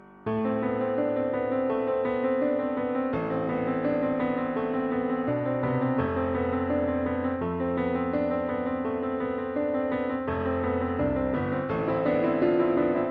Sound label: Piano